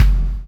CHAIN; Spectrasonics - Backbeat

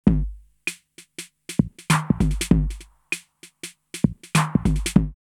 808 Relax! 98, DrumMachines; drumloops; X-Static Goldmine

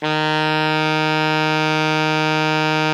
ALTO MF D#2, Saxophones; Greytsounds; Philip Wolfe David Crozier's Saxophones

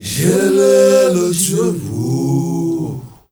MALE, CHOIRS; MALE GRP; Spectrasonics; Heart of Africa 2

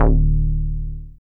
BAS, Eurotech; Sounds Good

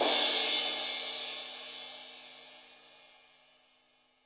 HiHat & Crash, Roland TD-20; HiHats

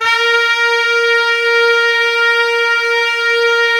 ALTO SAX B, SAX SEC.; Giga Samples Collection

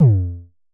Festival Kicks - F2, Kicks, Vermona Kick Lancet; LookIMadeAThing Kicks

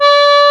ACC G5; East West - Global Sounds; FOLKLORIK

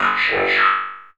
FX VOX; AMG - Vince Clarke Lucky Bastard

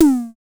DSM2 Tom; MPC DSM-2

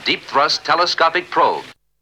Vocal; ZERO G - Datafile DISC 3

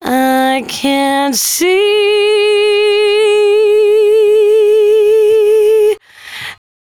113bpm C#, Velvet Vocal; Zero-G

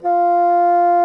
BASSOON F4, REEDS; Greytsounds VOL-1

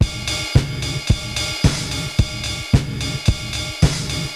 80s Gated Verb Korg MR-16 (110BPM), cyborg beats

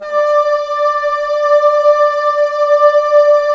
Bassoons D 5; Miroslav Vitous - Woodwind Brass Ensemble

